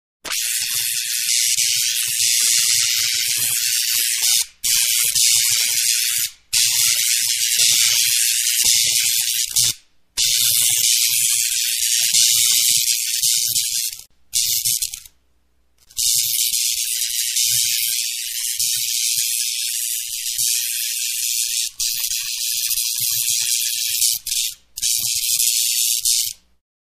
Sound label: Electricity sound; sound effect